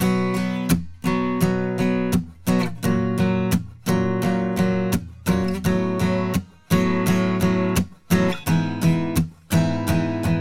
Acoustic guitar with 6020 Ultimate EQ

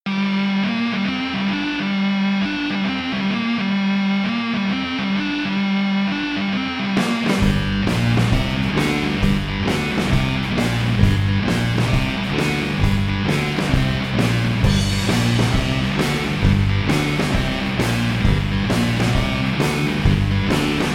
Overdrive

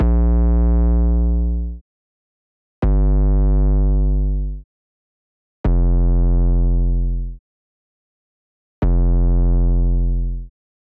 808 Wet; TrapDrive

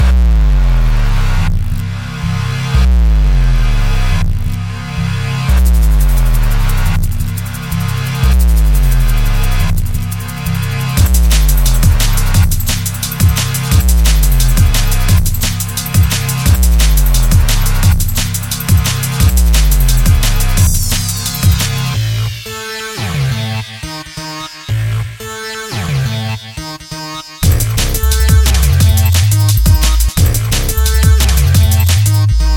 Drum Bass; Geist Expander

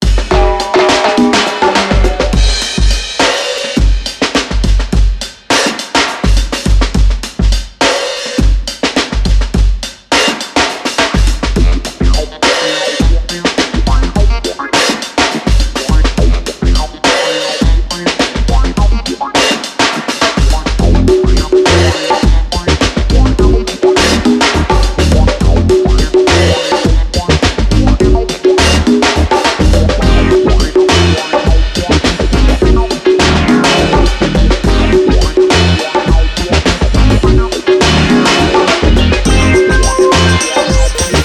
Lo-Fi Funk

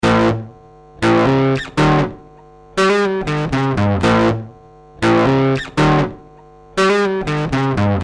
Gate Sound Sample Dry

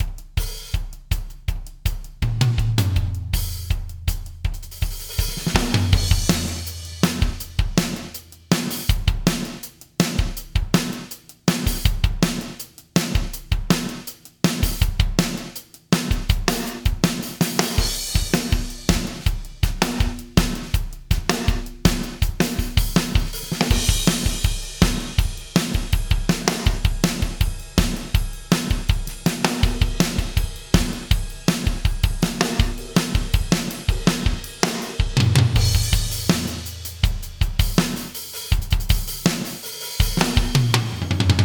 Eighties Pop Grooves, 80s; EZdrummer 2; 80s Preset

